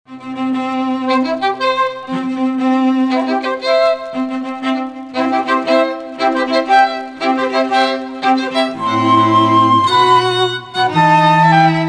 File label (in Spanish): Marcha Nupcial Mendelsson; repertorio misas de matrimonio